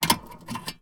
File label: industrial tools hand saw remove from hook; sound effects one